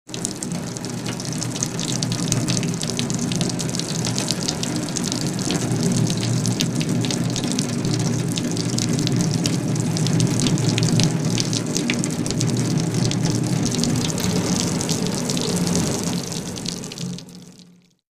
Rain Heavy Hollow Dru, raindrops, splatty

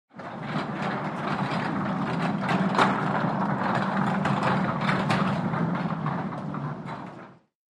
Gurney Cart Running; gurneys

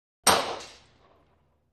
Pistol Fire Hit Targ

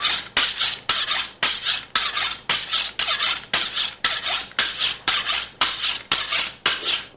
Sharpen Knife Fast, utensils

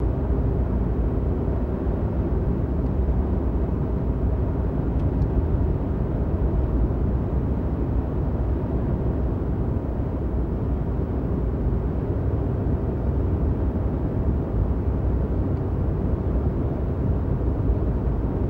Cadillac 1990 Interior Steady Loop

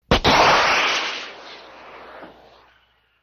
Tow Missile Firing

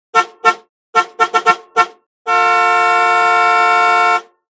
Horn Honk Buzzing Hor

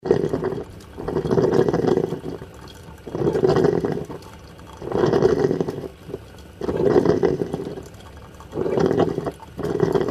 Coffee Perking 2 Chok, coffee brewing

